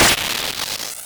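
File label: explosion medium, Retro Game Weapons Sound Effects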